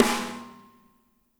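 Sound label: snare left; Spectrasonics; Burning Grooves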